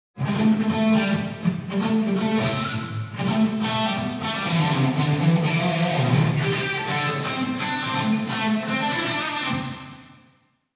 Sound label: e guitar wet